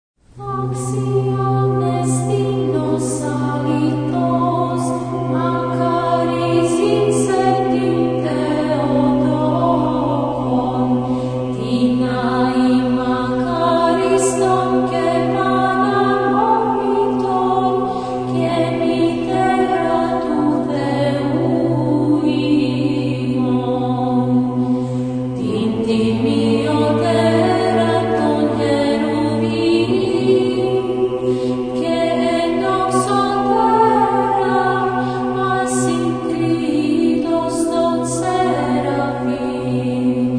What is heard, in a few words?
Aksion estin, Byzantine chant